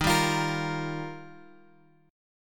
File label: D sharp Major Add 9th, Guitar Open F; strummed